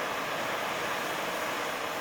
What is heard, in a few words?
Flame Thrower Idle Loop; Weapons